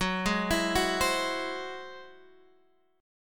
Ab Augmented 7th Gb, guitar

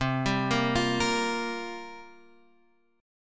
C 7th Suspended 4th, Guitar, Drop D

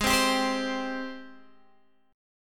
Ab Major 7th Ab down Guitar Open D

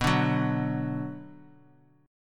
B Minor B; strummed; JGuitar

